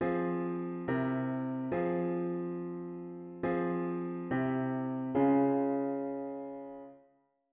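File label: diatonic root with key bass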